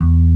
Bass E3; 音MAD; LoveLive

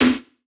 snare; 黄金拼图

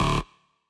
bass

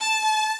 violin

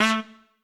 Trumpet melo

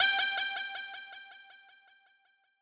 lead

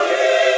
choir

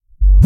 Dr Kick

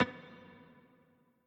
piano riff intro

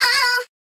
vox

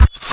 bass

